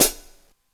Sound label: tamed high hat sound g sharp key, Royality free hi hat closed sound tuned to the G# note